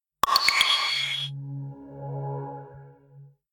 Message

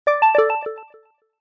prize notification jinggao; BLU G9

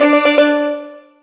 Folkdance short; 9210 CD

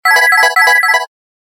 Serene tone; Samsung; SGH-T929 Memoir

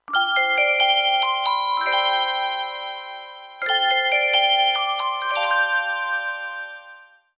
Tune Bell, ringtones; Meizu MX2; Flyme

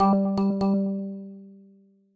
Pertinent, notifications; BlackBerry; KEYone

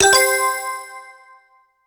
notifier bell; Orange Sanza

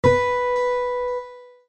One String Email, Six String; HTC

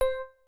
selftimer; Asus Zenfone-3-Deluxe

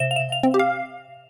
SMS

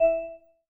Class Harp; Realme 8

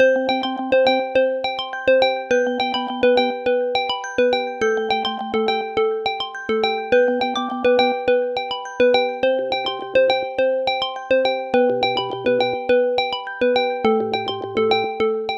Running, alarm; LG Fortune M153 Cricket

